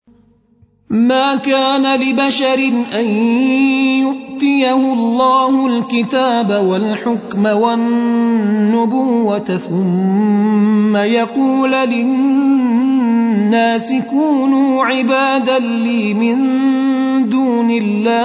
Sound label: Tar